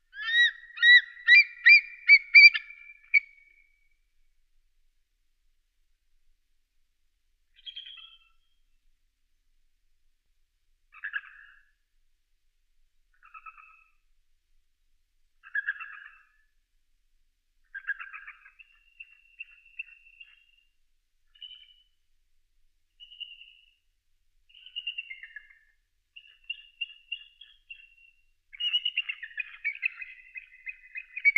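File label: Eagle Bald Chirps Calls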